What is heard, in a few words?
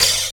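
96 OP HAT, open hi-hat; East West - Phat & Phunky CD2; 90's Sample CDs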